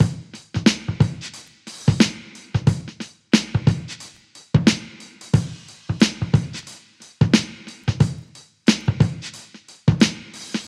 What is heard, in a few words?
90 bpm breakbeat e key, Free drum beat - kick tuned to the E note. Loudest frequency: 1114Hz